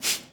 Boxing Female Punch Breath

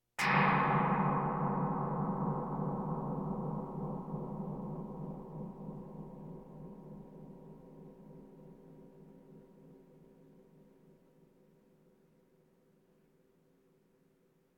Percussion Bongos Tympani rolls Gongs Drums

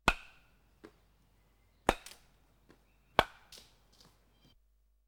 Baseball Hitting Bat; KMP Film Video Sound Effects

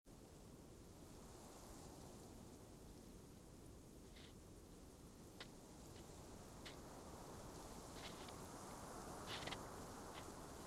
Frosted; Blind Quest - The Frost Demon

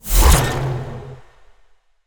Ice Spell Utility Levitation; Utility Spells; Arctic Aura

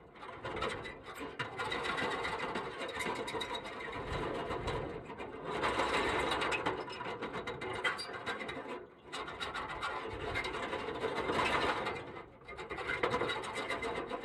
car suspension loop; Blind Drive

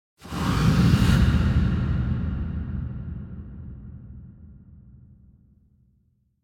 Impact Distant Deep Reverberant Breath; Hollywood Edge; sound designer's toolkit v2

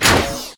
Pickup Scifi Health; SFX Kit

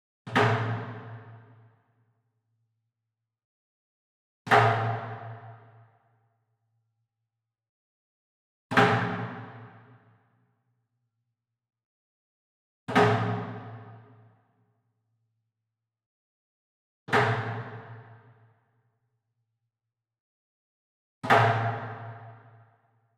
Wood Percussion Log Drum Traditional Flam Far; boom library; Cinematic Strikes Construction Kit

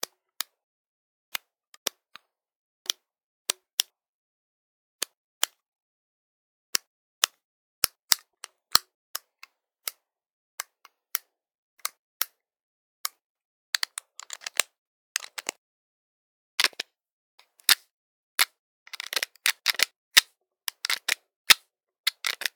switches; devices accessories utilities; NS Studios - ultra sound collection

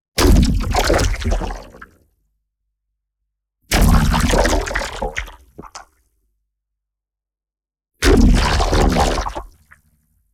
NEON ZAP Plasma Splatter; boom library

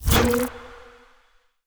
Ice Spell Utility Detect Magic; Arctic Aura

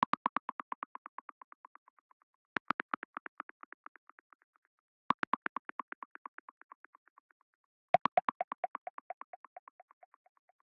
Arcade Click Neutral; boom library; The Interface

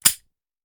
Hand Gun Foley; Sound Ideas; Guns

